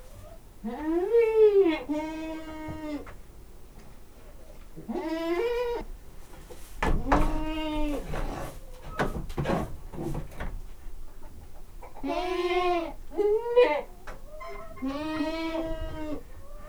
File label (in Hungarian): kecske ol hangulat; állathangok; paraszt udvar; házi kecske